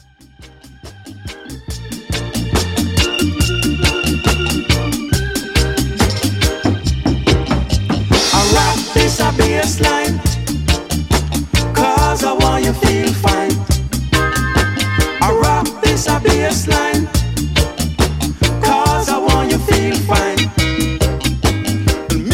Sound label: Ah Rock Dis Yah Bass Line